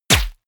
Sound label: bullet large impact body